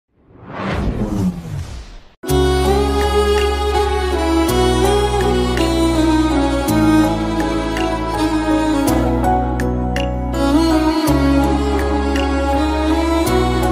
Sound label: violin bgm ringtone; rrr